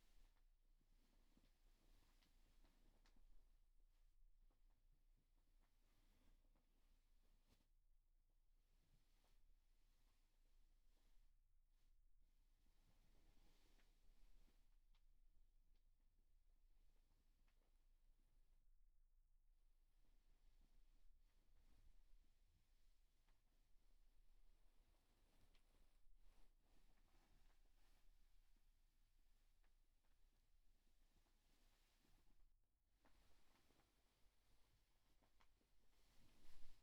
布団をもぞもぞ; KU100; 布団の音

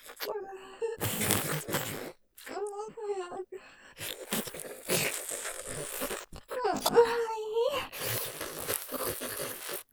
追い込み フェラ音マシマシ さらに激しくバキューム; おかずにスパイス２; 勢いマシマシ

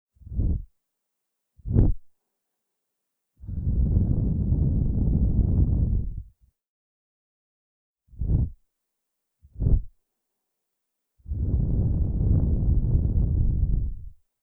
耳かき

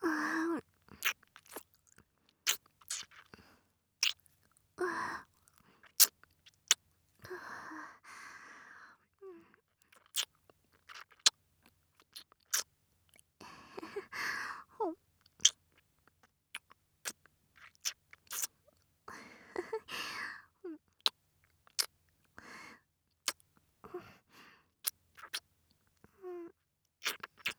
ひたすらキス, ASMR

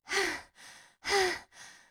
はあ、はあ, スポーツ少女

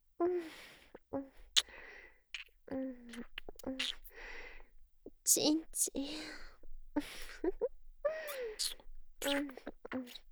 ち○ちん キス

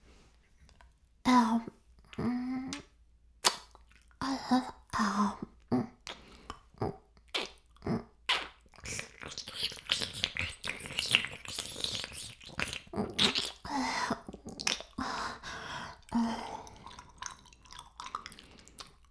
耳舐め音声; 白くて黒い魔性円環

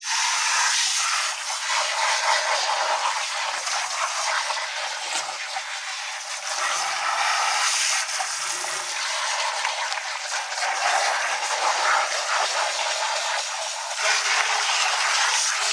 シャンプー, ASMR

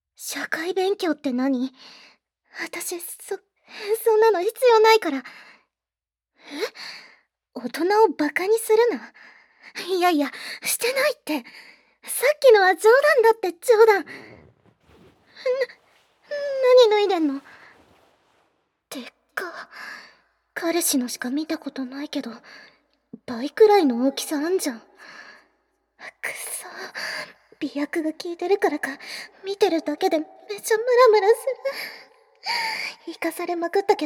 強制耳舐め手コキ・強制フェラ♪, ASMR; 催眠レベル1〜2

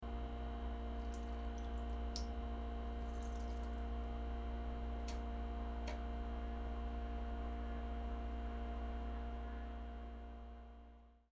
小さめおしっこ音、ガス音; 生撮り！; 盗聴; 吐息あり

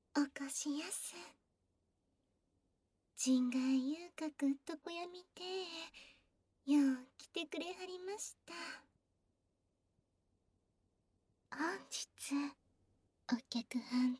ねっとり 耳舐め; 歓迎の接吻

